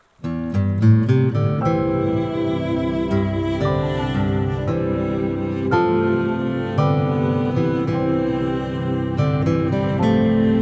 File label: Canon